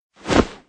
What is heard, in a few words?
baby dragon wing